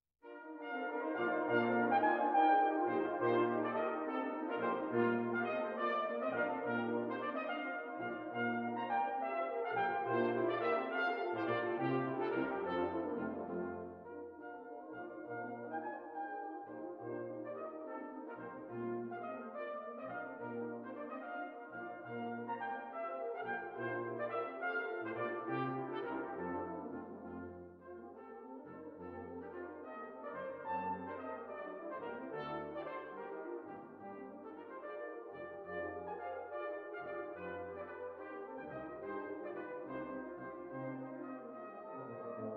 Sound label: orchestral suite no 1 in c major; bwv 1066